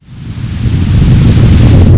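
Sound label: rocket launch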